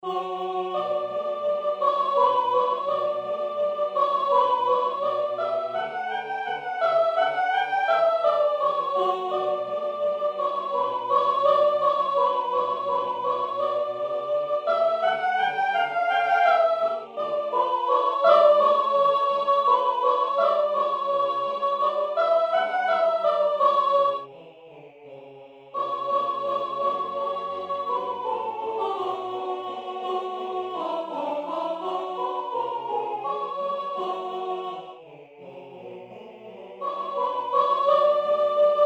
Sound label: Chanson d'automne sop